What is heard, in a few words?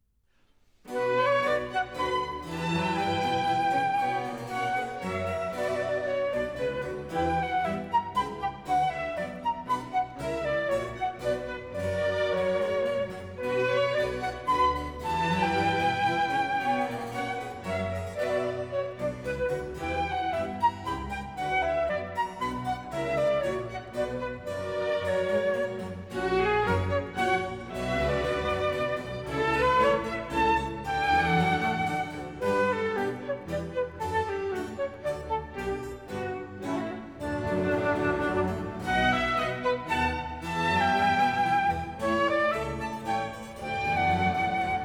Flute, Kammerorchester